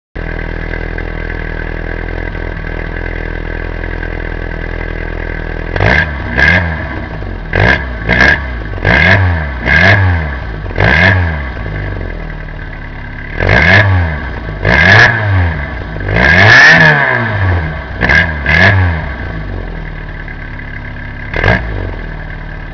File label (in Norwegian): knallert